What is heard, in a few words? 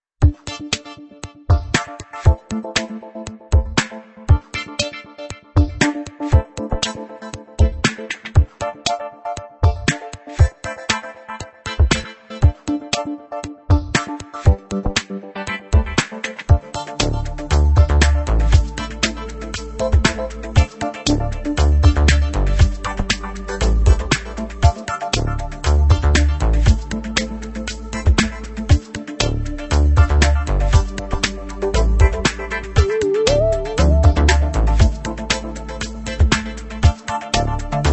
bgmusic